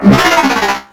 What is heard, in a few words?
att, enemy droid; CosmicRage; combat